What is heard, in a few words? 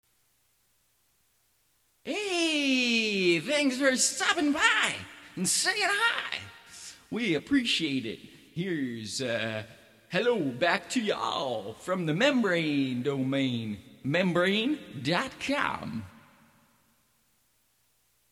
harmonica on the range; Arizona